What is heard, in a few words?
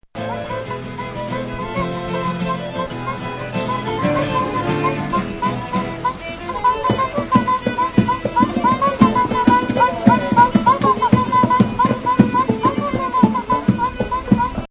cuica; manouche Les Vans 2006